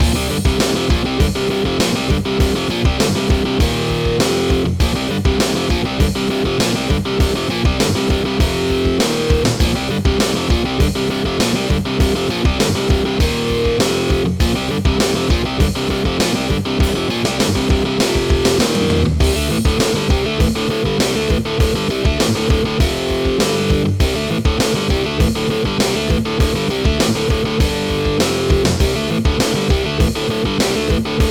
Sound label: Guitar Mayhem; action